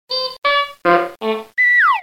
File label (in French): Orchestre Cartoon